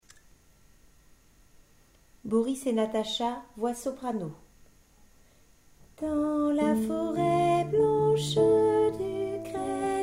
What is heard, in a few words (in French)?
soprano